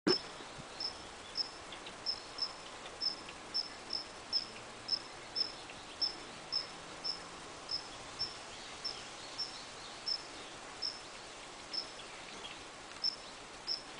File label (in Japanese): ジョウビタキの主張